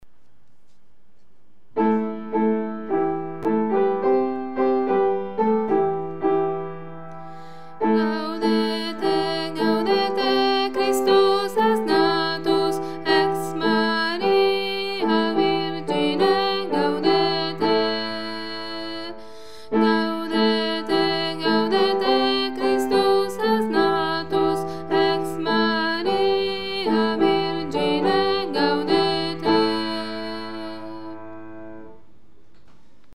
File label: gaudete contralti